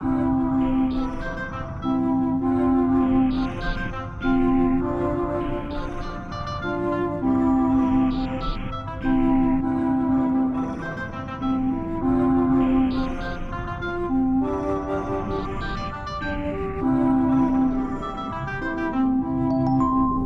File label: wind